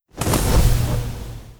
god ray hit layer; apocalypse

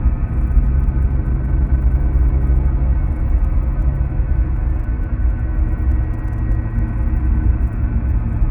machine empire warship hum; Stellaris; synthetic dawn

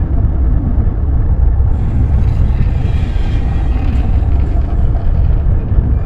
pirate battleship idle; ambient; Stellaris

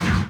distance point defense fire, weapons; Stellaris

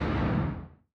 distance mass driver fire, weapons; Stellaris

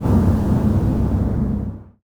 gas giant; ambient; Stellaris

